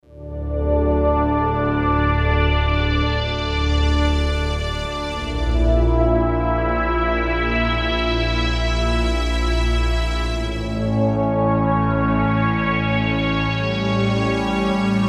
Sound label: Slow Swell